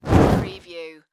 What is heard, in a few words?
Torch Pass By Whoosh